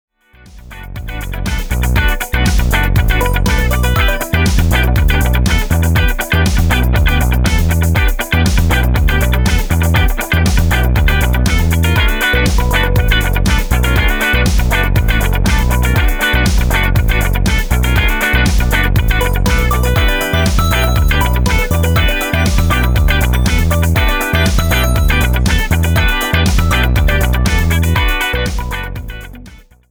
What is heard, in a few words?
Jogger 120bpm